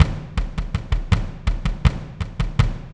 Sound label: drum